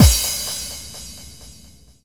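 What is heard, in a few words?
bass drum FX, dance drums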